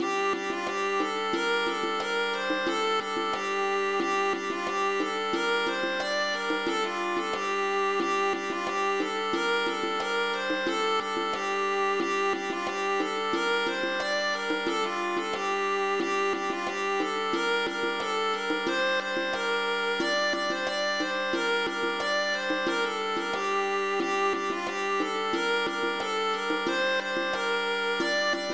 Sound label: drone